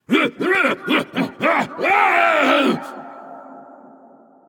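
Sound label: non verbal fear, voice; cernunnos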